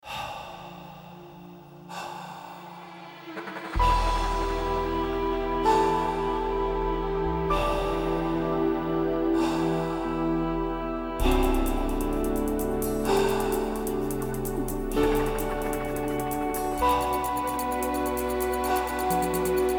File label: haunting; film cues